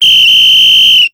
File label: police whistle; daniel simon